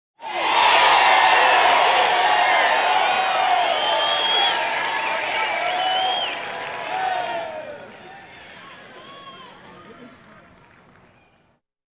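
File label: med crowd cheer light; Asian Show Sound Effects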